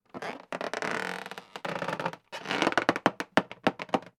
foley balloon rubbing squeak; sound effects one

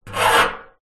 metal rusty pole scrape on metal oil drum; foley

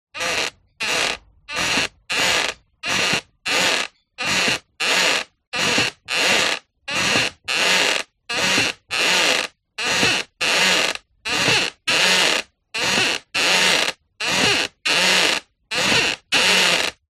household rocking chair creak

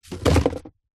foley cardboard drop lightly

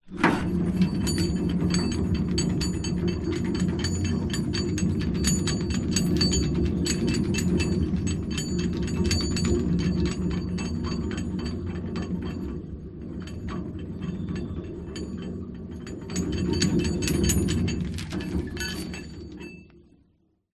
industrial chain on metal turn spin

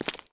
fantasy Footstep Dirt; little robot sound factory

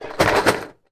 leisure board game game box place down hard; rummikub

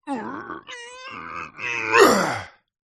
human male voice grunt groan lift heavy weight

